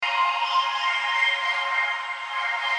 dropbass loop pad

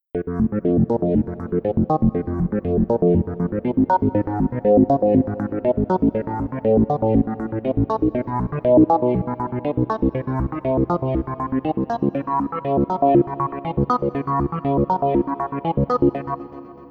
electronic dance

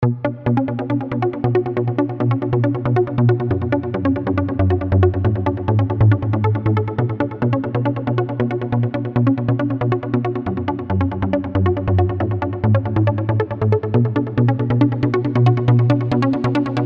trance lead

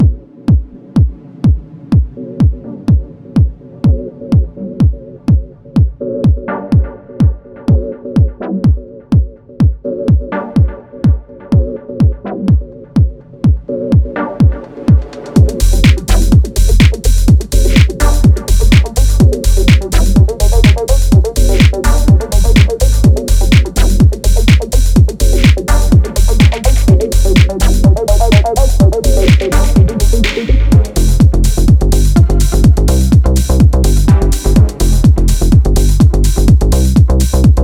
bass music techno

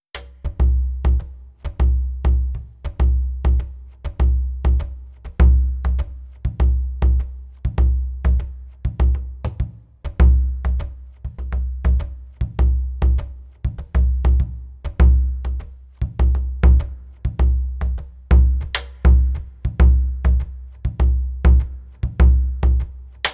surdo samba duro single

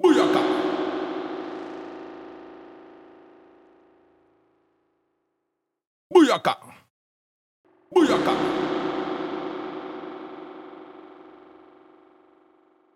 H910 harmonizer; Eventide

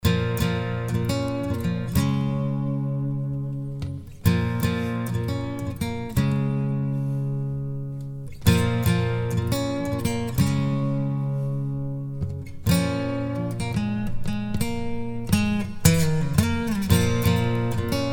dreamy guitar wet

